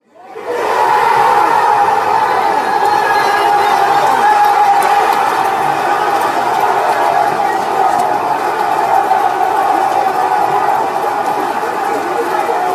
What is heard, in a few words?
score, fan chants; spurs